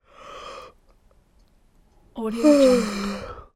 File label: yawn